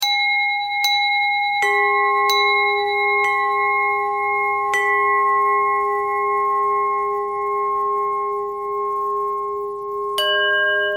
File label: bell bell thread simple, bells